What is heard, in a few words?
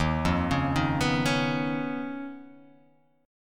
D sharp 7th Suspended 2nd Sharp 5th; arpeggiated; JGuitar